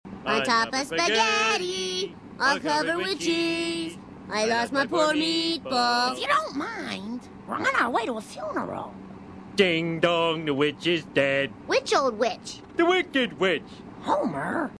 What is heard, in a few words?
ding dong